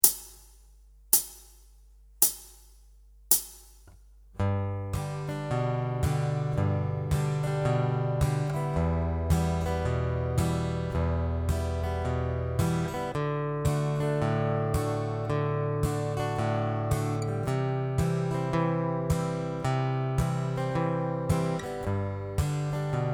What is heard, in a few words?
Bass Note Picking